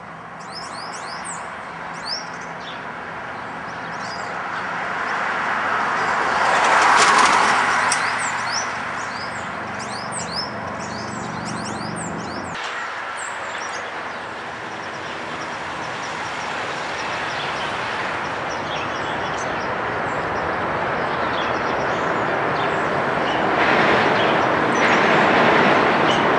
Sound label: Cooper's Hawk morning